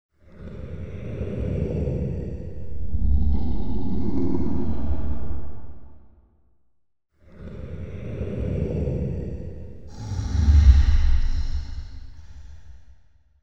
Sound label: beast growl